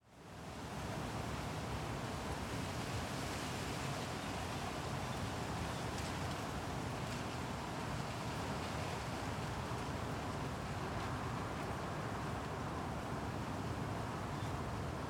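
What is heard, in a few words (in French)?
Nuit, Paysage sonore; Tascam DR-100MKII; Stereo; France; Arcueil; Vent Circulation automobile lointaine; Mobile musical; Wind; Distant car traffi; Micro Uni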